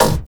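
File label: snare drum, Drum & Bass, 160 BPM; Ueberschall; Drum & Bass Flash Trackz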